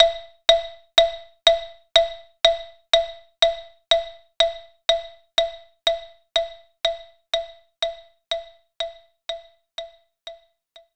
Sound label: Xylophone E4; knights try